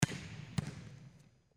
volleyball single serve

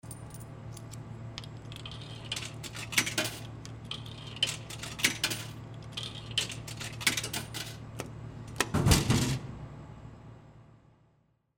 softdrink vending machine

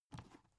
dvd case pick up soft

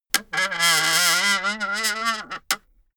wood creak high

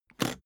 suitcase latch lock jump open

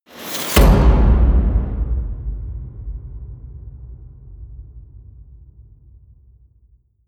mid transform hit

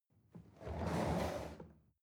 chair wheel roll on floor soft

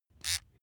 razor small short

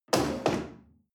chair wood place slide hard

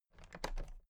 toolbox latches open very soft

